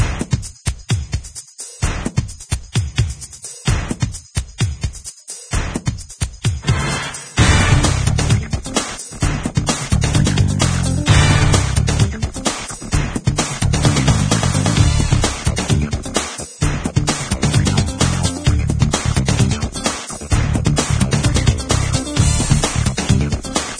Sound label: Menu Music